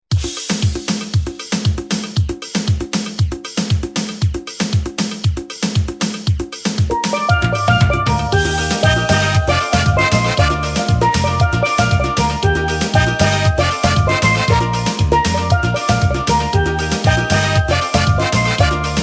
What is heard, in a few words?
steel and voices